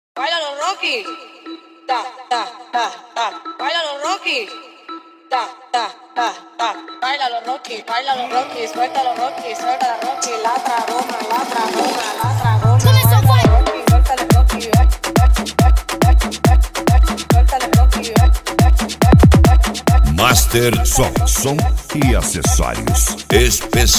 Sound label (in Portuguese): reggaeton